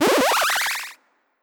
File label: Plasma Charging